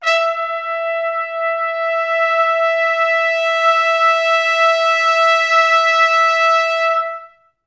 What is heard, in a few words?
E4, Pop Brass; Best Service; ProSamples vol.19; AKAI